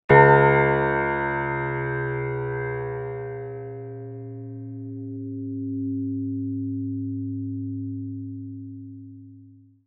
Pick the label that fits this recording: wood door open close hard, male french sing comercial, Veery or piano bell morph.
piano bell morph